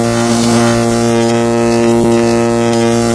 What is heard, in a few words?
megazap; quake2; kitsune